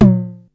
tom hi, Yamaha Motif ES-Series; 300 Drum Machines

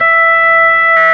ORG B3, organs; Sound & Vision; Gigapack I CD 2

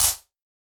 Wider Clap

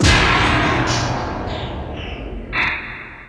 Kaos FX, Ambient Grooves; Sonic Foundry (Sony Creative Software)

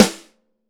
DRY SN, STUDIO DRY SNARE

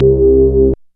BASSMAN, OBERHEIM; OLD SYNTHS; Rubber Chicken Software Sample Bank

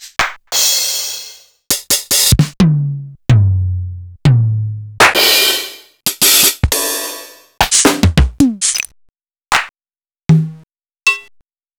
Oberheim DX

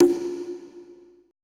CONGA L; BPC DRUMS

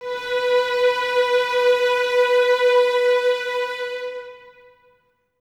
violins B 4; Full; String Ensembles; 23 Violins; Miroslav Vitous

